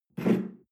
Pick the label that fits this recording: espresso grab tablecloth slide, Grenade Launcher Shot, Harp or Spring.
espresso grab tablecloth slide